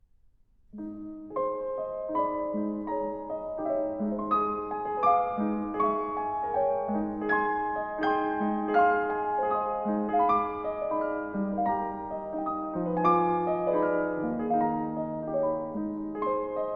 Piano Pieces, Intermezzo in A Flat; Brahms 8 Piano Pieces, Op.76-3

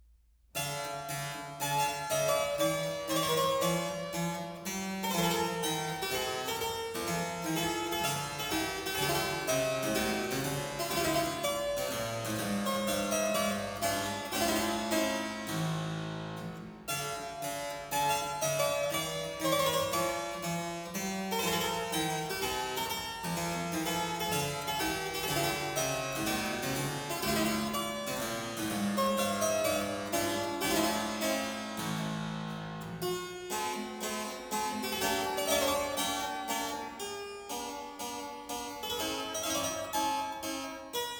Keyboard Sonata in D Minor, Theme, Allegretto

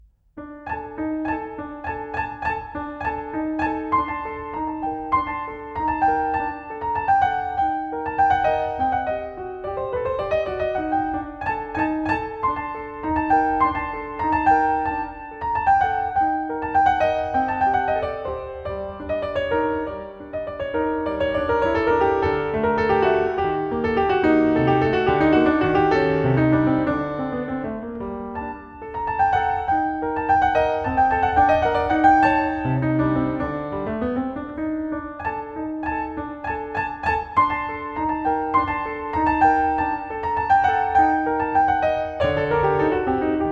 Piano Pieces, Dance; Barkhudarian; Series 2 No. 3, Gulnara's Dance

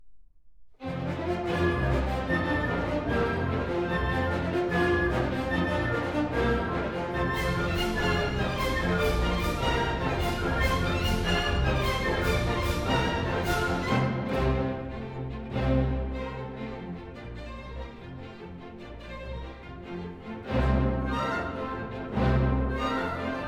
Hungarian Dances for Orchestra in F Major, Presto; Brahms

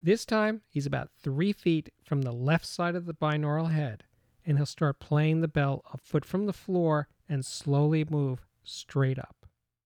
Height Left Channel Bell Announcement; The Ultimate Headphone Demonstration Disc ( 24Bit192kHz ); Chesky Record

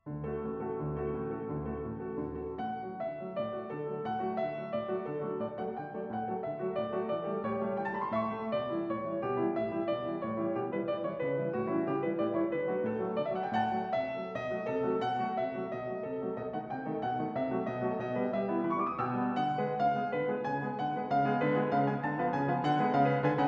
Pas de deux Coda Piano; Tchaikovsky- The Complete Solo Piano Works

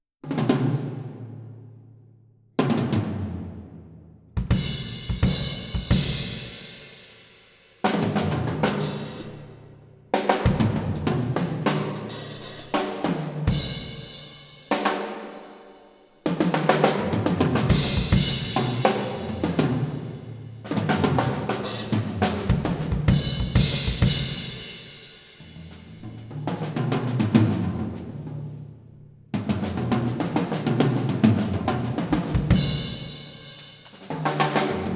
Drum Solo, binaural sound show; Dr.Chesky's Sensational Fantastic and simply amazing binaural sound show; Chesky Record

